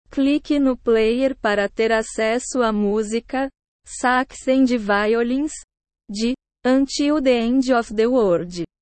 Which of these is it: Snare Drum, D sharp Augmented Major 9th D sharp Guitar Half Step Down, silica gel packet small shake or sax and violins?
sax and violins